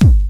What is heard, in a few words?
DR. LOOP; X-Static Goldmine 3